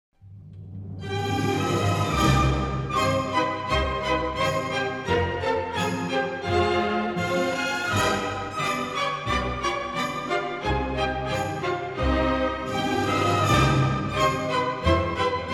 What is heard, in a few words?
Female Variation SLOW; Esmeralda